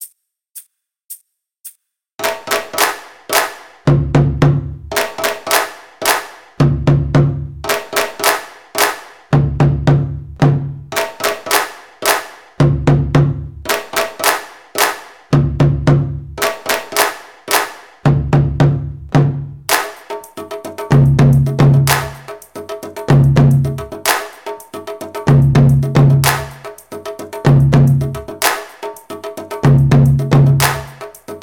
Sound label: Thunder Drum Solo